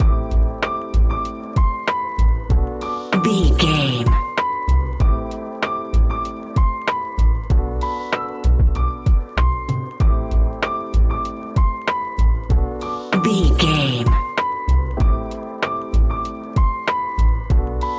galactic body beat, A relaxed and chilling piece of music